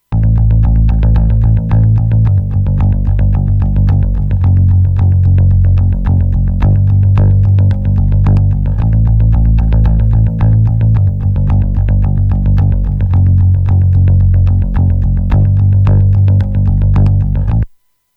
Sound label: Bass; Bettermaker